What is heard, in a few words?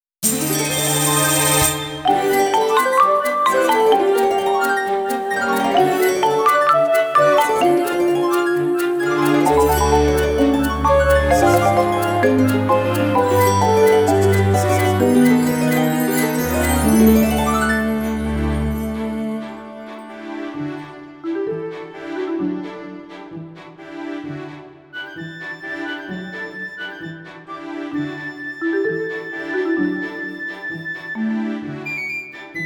Harp Performance